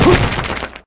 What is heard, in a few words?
dash